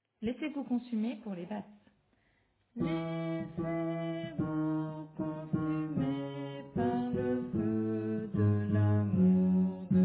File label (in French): Basse